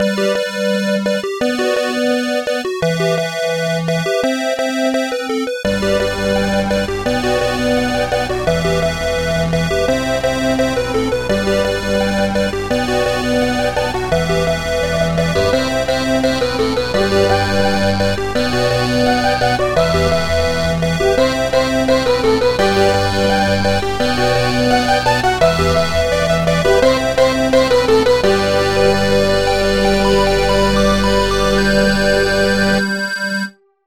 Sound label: Synthlead; The Office